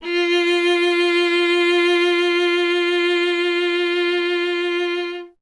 viola F; Miroslav Vitous; Solo Instruments VOL-1

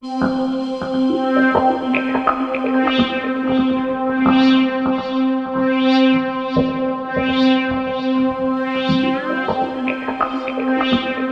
C4, SynthLine; 90 s Sample CDs; NiceBeats; SynthLine VOL-5

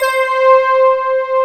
PAD, SYN PAD; AKAI S6000 CD-ROM - Volume 1; SAMPLE WAVE MIXING 1

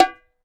Bongo Hi Mt; Percussion

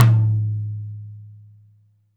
tom lo, Alesis DM5

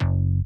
Bass Shot f#; Multi Drum Loop 11

